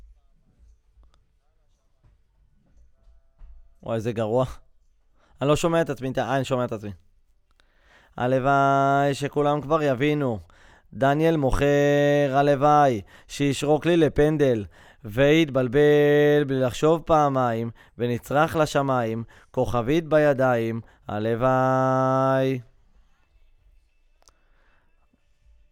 VOC GUIDE

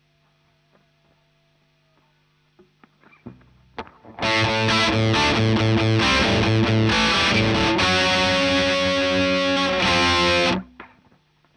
Marshal Gtr

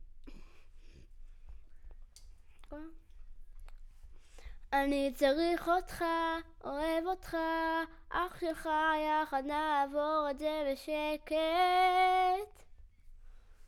Children Choir; Studio Bekol Children Choir

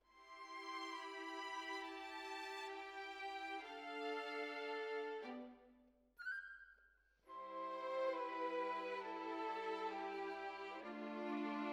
Violins Over

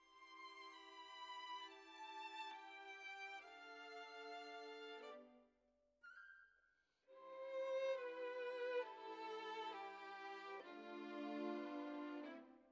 Violins, jazz for piano

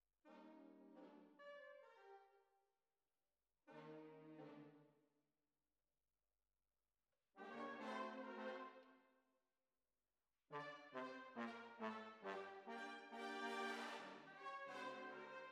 Tuba, jazz for piano

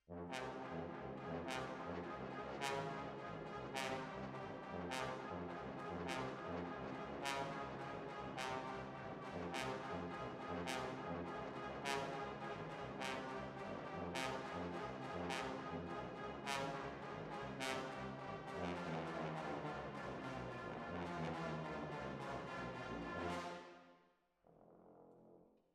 Hall

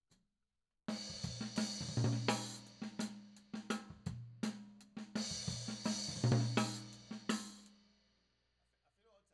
Tom

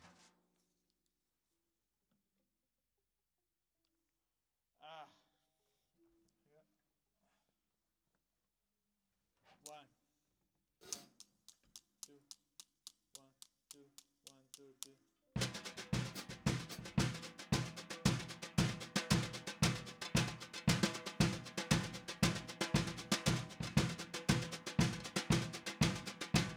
SNR

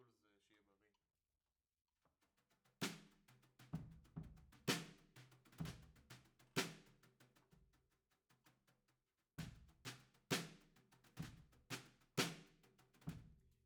Overheads, 96bpm

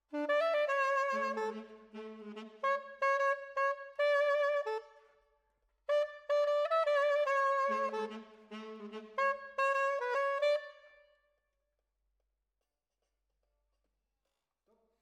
Sax

